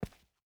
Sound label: footstep boots concrete walk; Rust